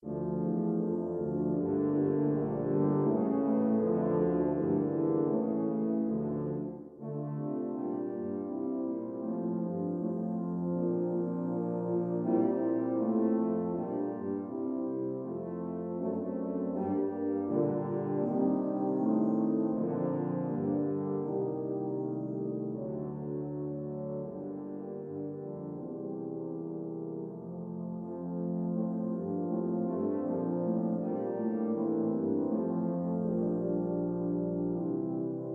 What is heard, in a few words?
prelude in d minor; bruckner